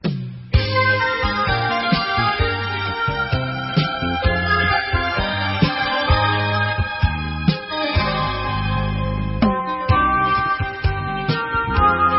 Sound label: Instrumental